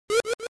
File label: Swim